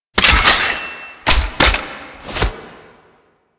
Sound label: Shotgun Reload; Warframe; Veilbreaker; Kahl